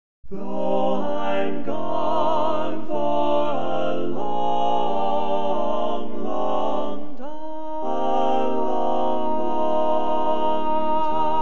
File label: Lead, Classic Tags